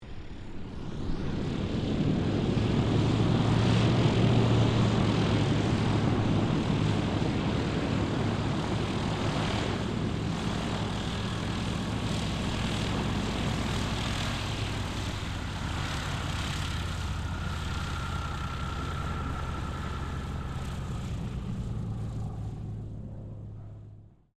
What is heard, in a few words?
AIRCRAFT CA-13 BOOMERANG TAXI; interior; Airplanes; Vehicles; Aircraft Collection